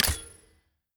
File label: Summoner Spell Use; LoL; hud